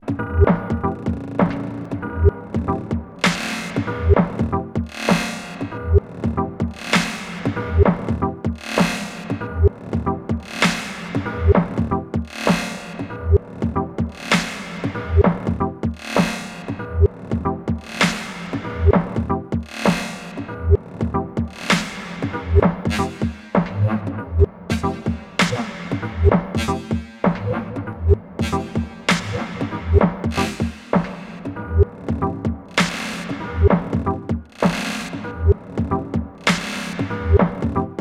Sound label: Modulation Grain Speed Parameter on loop resample source; 1010music BitBox Micro